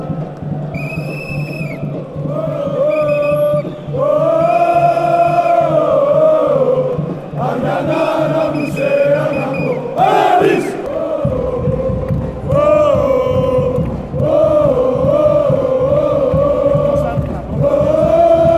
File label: aris chant